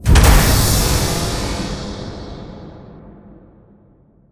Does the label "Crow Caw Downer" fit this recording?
No